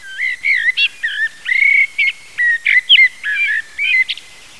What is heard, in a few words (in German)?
Amsel, Klicken Sie auf eine Amsel, und Sie hören ihren Gesang!; Amseln